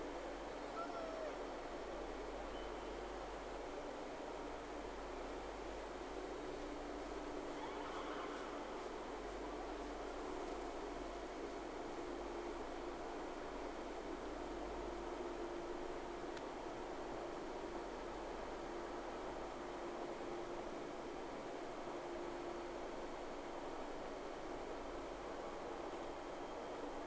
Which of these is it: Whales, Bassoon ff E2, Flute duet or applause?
Whales